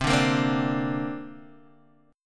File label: C 7th Suspended 2nd, Guitar, DADGAD